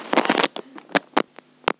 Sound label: Analog Tape Noise; Membrans; Analog Tape Noise Sound Pack 01; GDC Game Audio Bundle